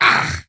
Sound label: wound, creature; Shadron